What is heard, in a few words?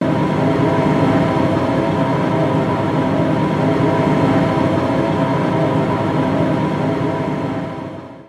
Chase Trem Loop